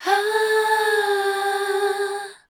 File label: MELODIC A, Voice